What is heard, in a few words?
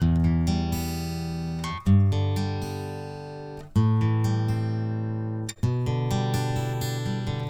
Acoustic Steel Emin 128BPM